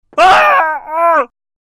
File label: scream; teamcity